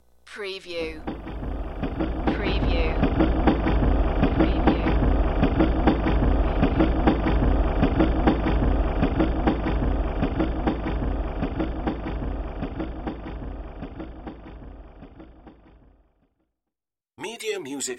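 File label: Train Passing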